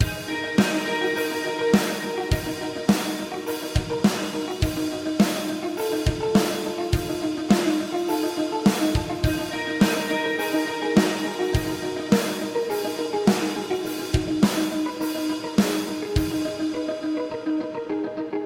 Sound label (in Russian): zatsiklennye melodii dlia gitary loop